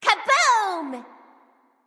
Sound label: Kaboom; Scylla